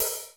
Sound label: ROOM HAT, ROOM KITS; Crypton; DrumBase5000